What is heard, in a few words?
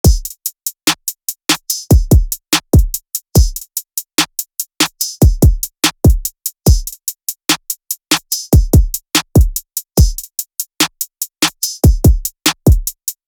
Drum Loop - 145 BPM; Cymatics; Banished; Havoc Collection